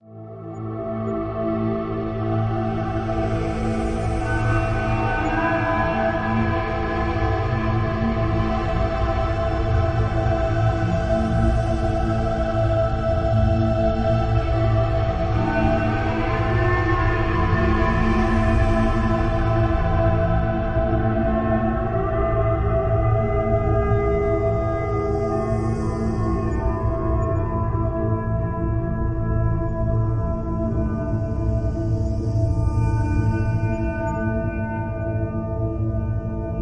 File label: Eastern Wind, Drone; Gothic Storm Music